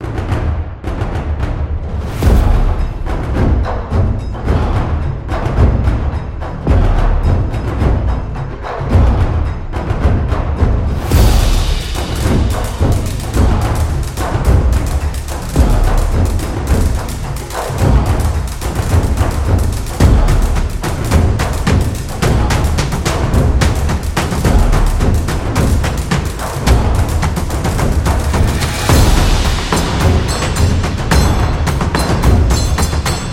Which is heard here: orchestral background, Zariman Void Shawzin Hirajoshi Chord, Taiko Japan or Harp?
Taiko Japan